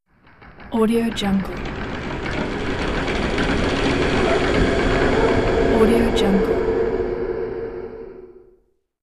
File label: spooky roller blind; marburymedia